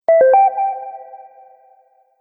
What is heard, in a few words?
Soft Subtle Notification; Musical